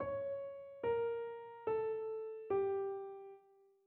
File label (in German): Tetrachord Span2 cis ab; Tetrachorde